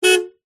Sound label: vehicles car hyundai trajet horn beep single external; 2006